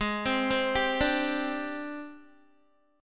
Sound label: G sharp Major 7th Flat 5th, Guitar; arpeggiated; chord; 15,15,12,12,14,x